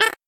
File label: peeper